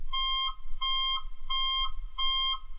reverse beep; rigs of rods

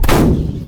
tracker; endless sky

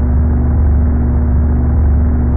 engine; stunt rally

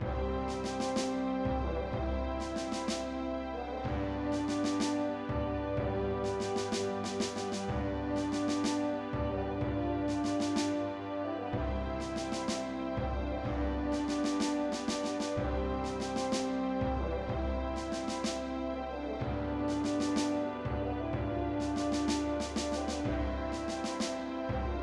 victory; commander genius

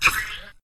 alien gatling; project hawk

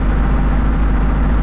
engine; stunt rally

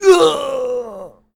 dwarf die; wesnoth

